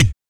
VOC PERC, BASS PERC

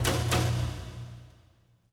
Orchestral Loops STRING ACCENT

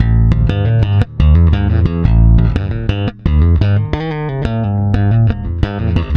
PWR B, Bass, PWRSTRUM; Spectrasonics; Bass Legends; 128 PWRSTRUM

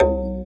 EQ Perc, Percussion Mix

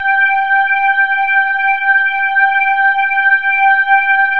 MKS80, PADS; Masterbits; Vintage Voltage

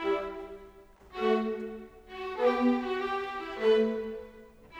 Strings; Sonic Foundry (Sony Creative Software); Orchestral VOL-2; Modern 02

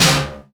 CYBER KIT, DRUMS

